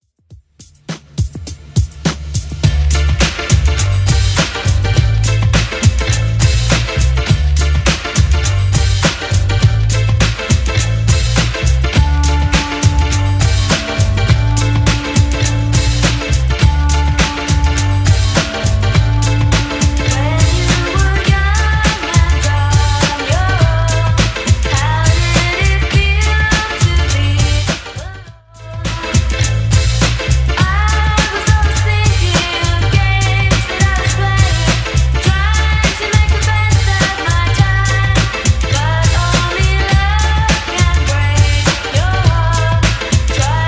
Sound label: 90s, 103bpm